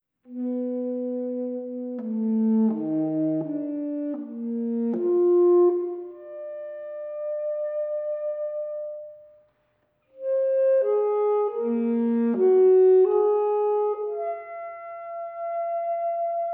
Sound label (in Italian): Sax Contralto